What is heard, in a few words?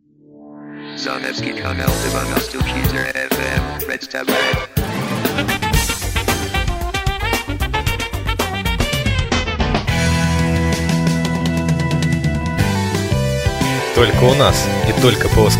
jingle